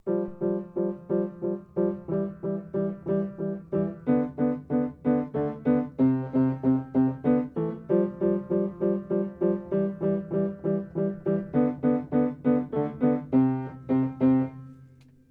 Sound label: real chopsticks